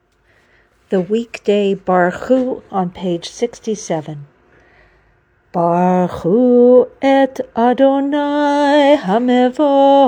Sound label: Weekday Barchu, morning minyan melodies